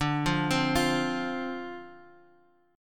F 5th D, chord; F5/D arpeggiated

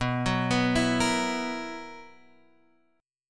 B Major 7th Suspended 4th B down

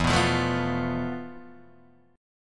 F Major 7th Flat 5th E, guitar chord

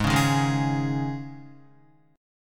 Ab Suspended 4th, Guitar; Absus4 strummed; JGuitar

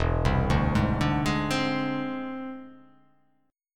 E Augmented 7th E down; E+7 arpeggiated; JGuitar

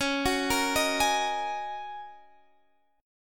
F sharp 6th Add 9th C sharp

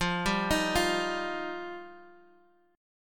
F 7th Suspended 2nd; F7sus2; arpeggiated; JGuitar

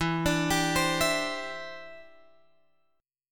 E Minor 7th Sharp 5th, down, Guitar, Drop D

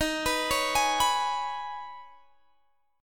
Eb 7th Suspended 4th Sharp 5th Eb, Guitar Drop D; x,18,21,18,21,19; down

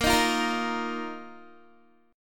Bb Suspended 4th Bb down Guitar; strummed; tuning 4F,4A,5C,5G,5C,6E

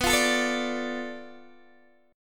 B Minor 7th Flat 5th B Guitar DADGAD

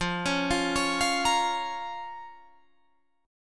F Suspended 4th Sharp 5th F, Guitar Drop D

